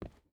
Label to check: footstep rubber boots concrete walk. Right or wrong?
Right